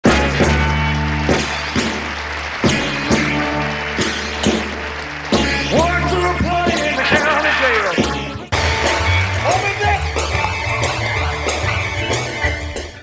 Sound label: applause